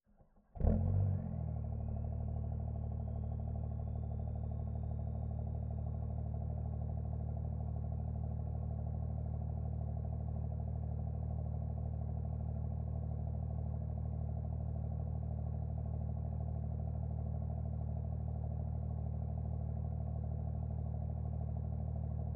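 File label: Dodge Ram Diesel, onboard, IDLE, Start Steady Blips Off, EXHAUST; Pole Position Production; Moon Mic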